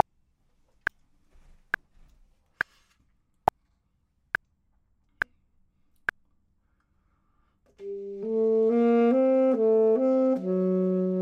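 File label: bottom, Saxophone and Clarinet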